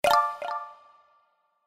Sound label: stone cage; Koby Jump Escape